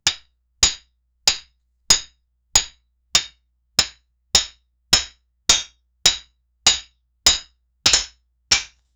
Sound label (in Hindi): bahut sare log dande se, sticks